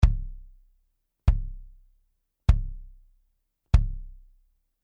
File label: BASS Retro Screen Reso Soft Felt